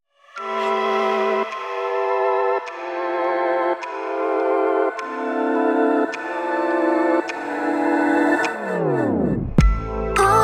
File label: Clock Ticks